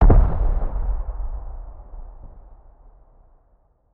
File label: c4 explosion distant; Rust